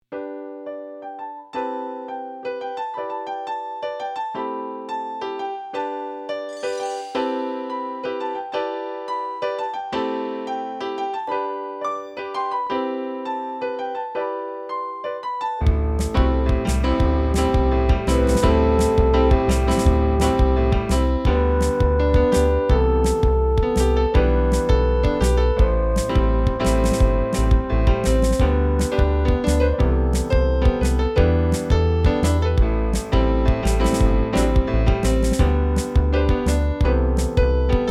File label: Instrumental